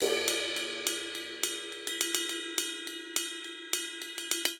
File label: Country Cymbals Only 209 bpm; 4 4; MIDI Clips; Ableton; Factory Packs; Drum Booth